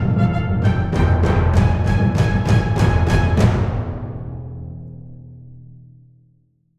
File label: Tension B Long; Racing the Wind 02